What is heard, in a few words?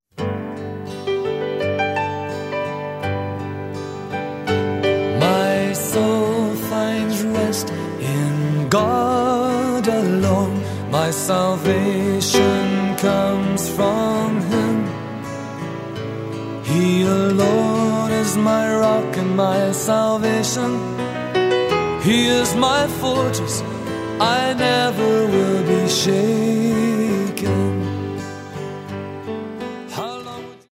Piano